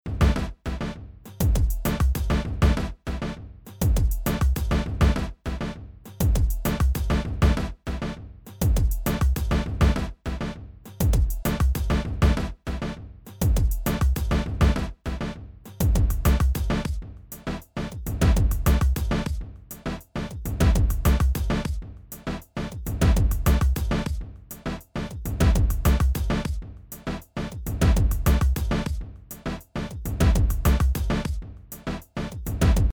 NI Battery 4 reggaton kit

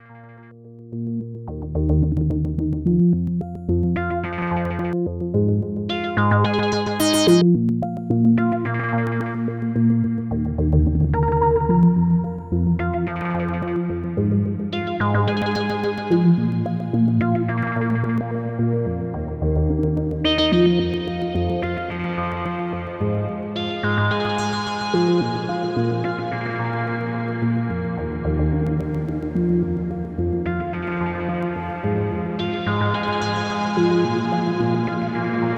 Synthesizer Reverb Plate; Immerse MK-II

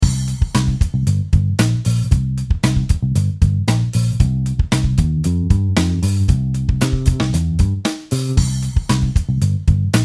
Drums Bass original

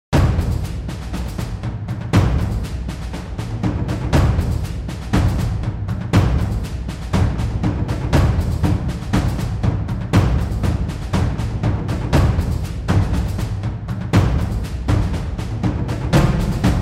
Basic Accents 16th feel 5 Grundsounds

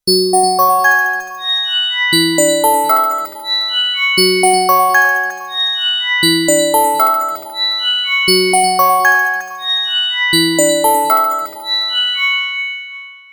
Korg minilogue xd

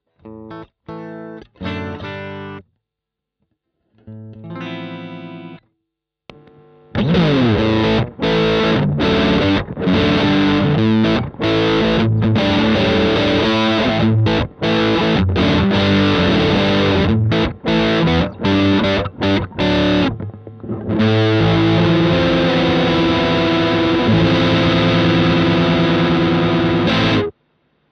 BM20 ULTRA Gain max Chords

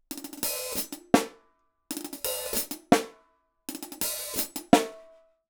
Hihat Snare; sE Electronics sE 7

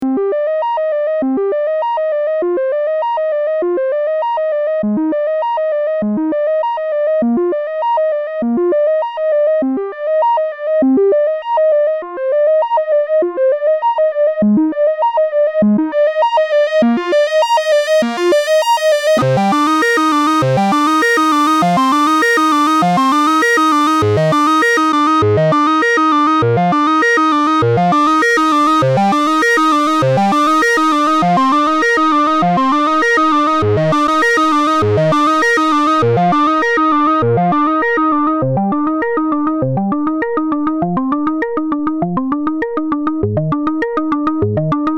behringer pro-1 melo seq

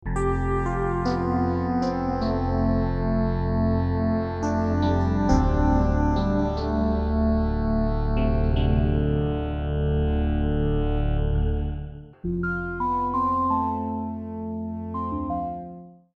epiano

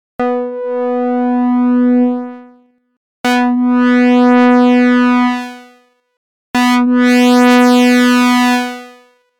Env inverted

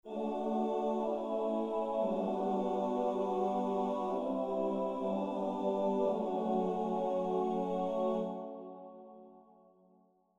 Choire Tenors

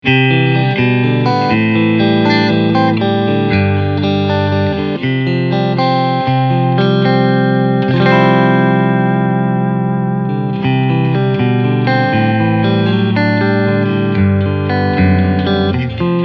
Clean Channel

